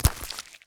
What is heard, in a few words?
step, spider; mob; Minecraft; Votive World